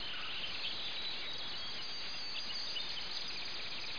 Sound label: Birds; deadlock